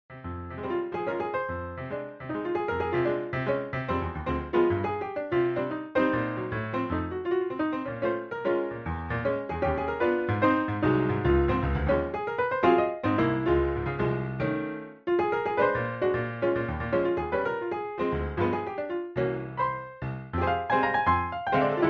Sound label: Yamaha C7 Grand Piano; Pianotrone; Syntheway